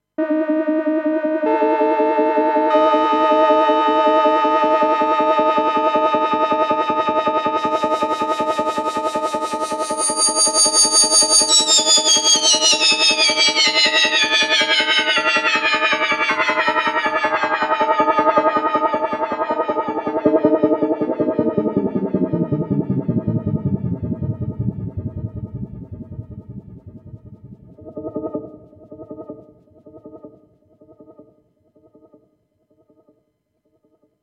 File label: ResoCut FX